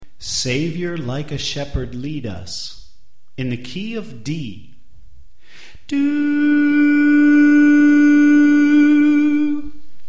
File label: Tenor Left